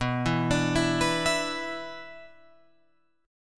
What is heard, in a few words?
B Minor Double Flat 5th B Guitar Open F, chord; Bmbb5 arpeggiated; JGuitar